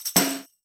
snare tambo

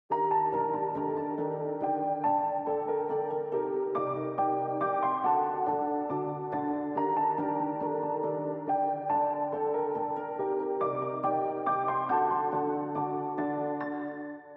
Piano Melody Arp BPM140 Dmin Dark Sad; Tattoo

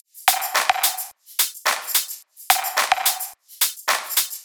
Drum Loop (no Kick)

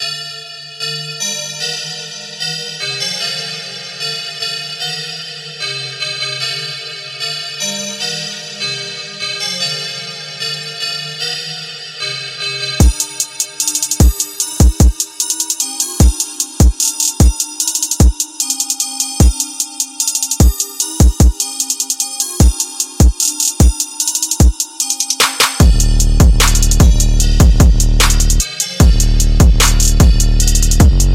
Trap

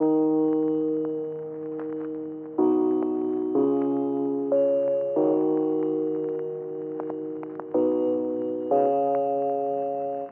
F- 186bpm keys

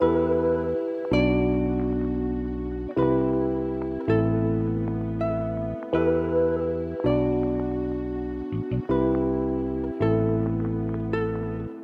G 162bpm Full Mix

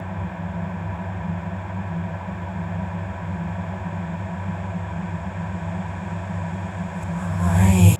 120 F Gentle Fx Reverse Vocal

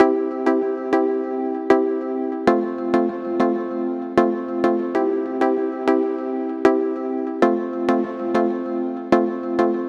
Chord

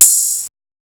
hihat open

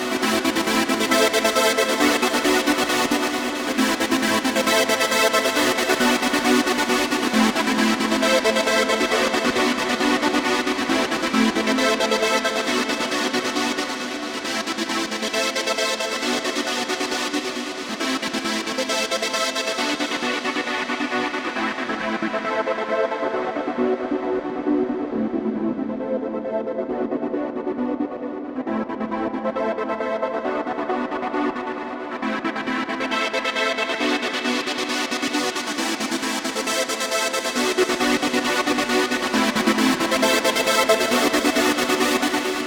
Synth 135BPM F m Pad Filtered

